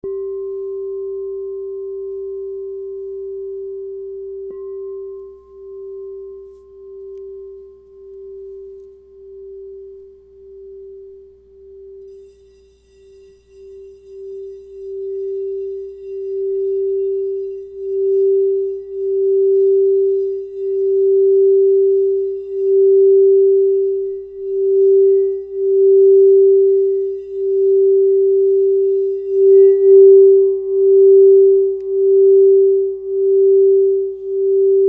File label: Crystal Tones Singing Bowls